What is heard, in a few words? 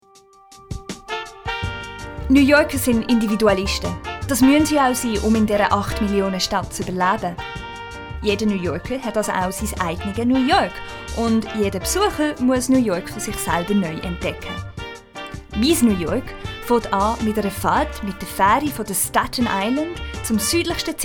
Music